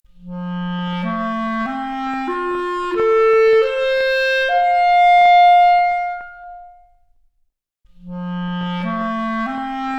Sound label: Clarinet arp, Stereo output noised; 4bit